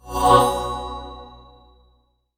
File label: resurrection; lilith